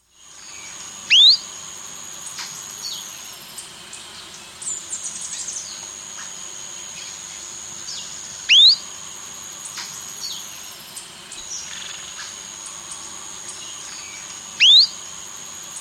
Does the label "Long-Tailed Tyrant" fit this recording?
Yes